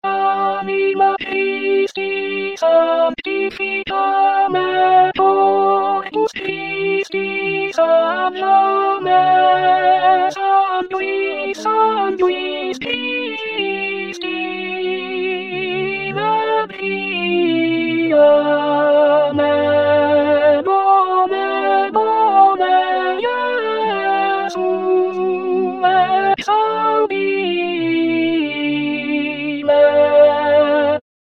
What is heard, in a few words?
alto; anima christi